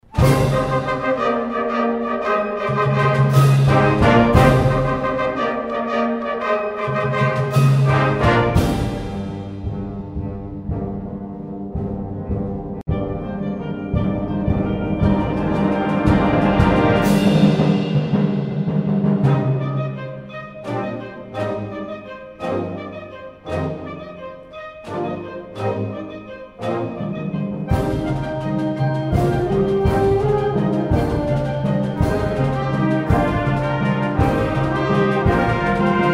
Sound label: Wind Ensemble Ukrainian Bell Carol